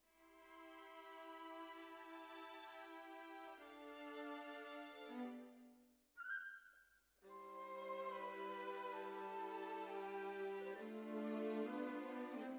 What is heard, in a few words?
Cellos Over